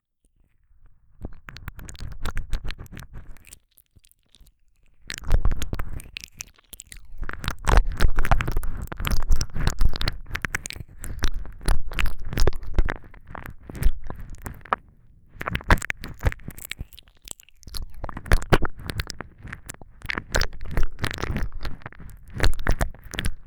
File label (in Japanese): ベロベロ耳舐め, ASMR